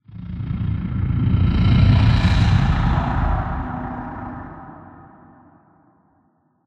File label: Roar Interior